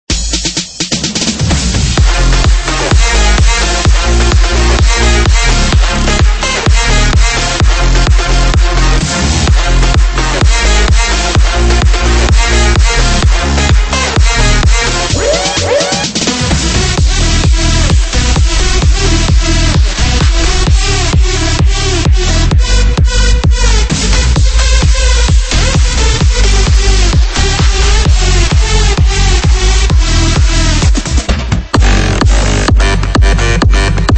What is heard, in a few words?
Bass; Revealed Spire Bass Vol. 3